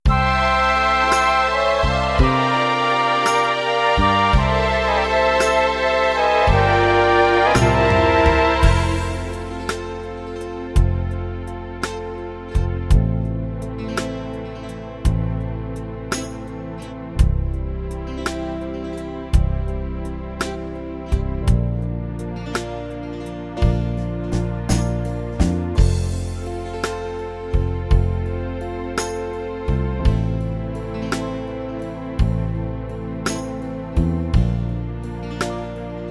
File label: 68 String Ballad, rhythms; mzx500; preview pack 01